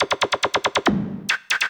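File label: SNARE ELECTRO 140, Techno Drum; Techno2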